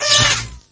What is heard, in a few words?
electrocute